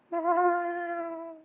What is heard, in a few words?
meow; Text-to-Meow